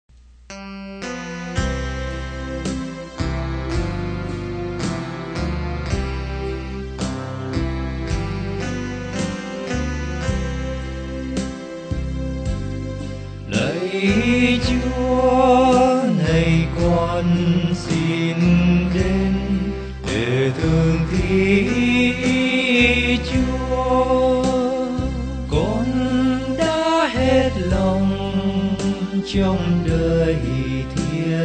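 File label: soprano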